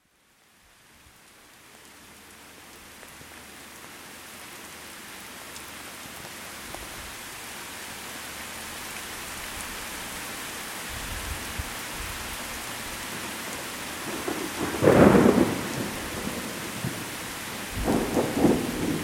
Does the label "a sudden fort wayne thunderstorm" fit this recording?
Yes